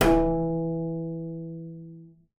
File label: BING E3, BASS FINGER; Xsample VOL-5